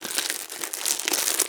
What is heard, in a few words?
Wrapper Open, Kitchen